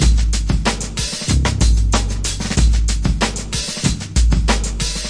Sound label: 94 bpm rolling, drum loops and breaks